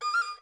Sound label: trill, erhu; playing tech